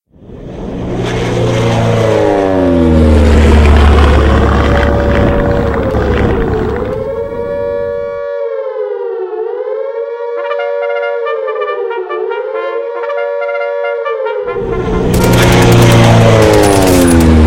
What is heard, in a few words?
War Bombs Flyby Sirene